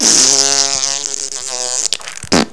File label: death; quake2; coop